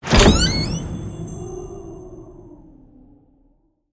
Door Open Squeak; the unfinished swan; Blue Zone

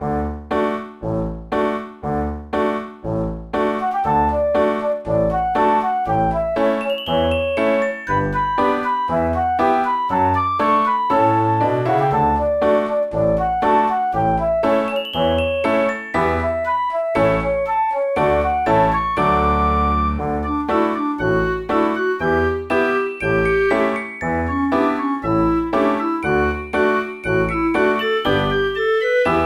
BGM System; Kirei Zukin Seikatsu 2; NDS

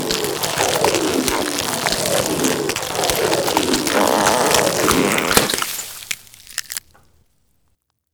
Stretching Flesh; a dump in the dark

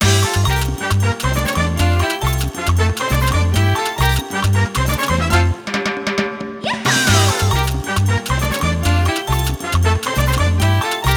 Bowling Menu; Monkey Bowling Menu; Super Monkey Ball

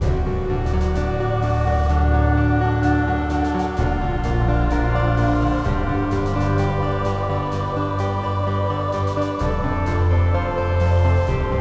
Menu loop; battlevoid harbinger

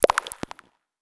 UI Journal Update; We Are the Dwarves

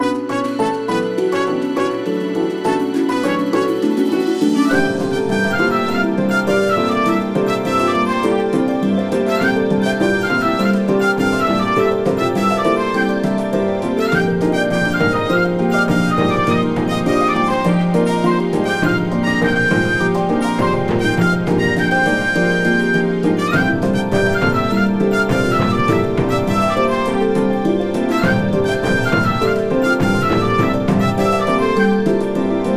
Skydive Mini-Game; the legend of zelda skyward sword wii gamerip 2011

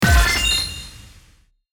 Archer Level Up

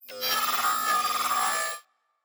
Data Calculating

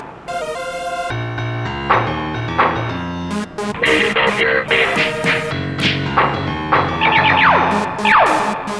super robot

